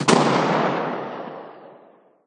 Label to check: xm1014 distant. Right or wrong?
Right